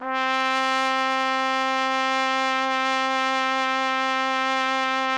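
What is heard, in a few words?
TPT, trumpet; Roland - LA Composer vol. 3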